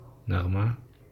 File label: melody